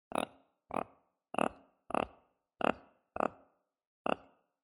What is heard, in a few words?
E mongoz grunt, lemur; Eulemur mongoz; The Audible Phylogeny of Lemurs